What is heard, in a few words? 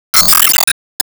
Bombdaruma Active Blast; disney star smash android ios mobile gamerip 2020